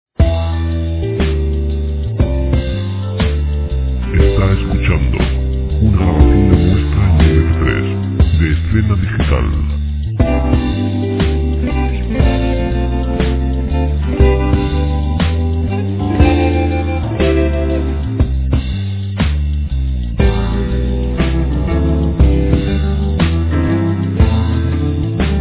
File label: guitar document; documentales